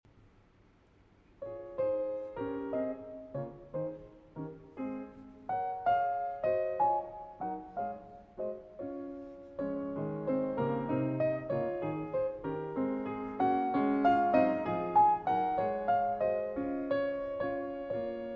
Piano Sonata No. 14 in C Sharp Minor 2. Allegretto; Op. 27 No. 2; Moonlight